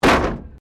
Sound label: Wreck Door Panel Clunk Metal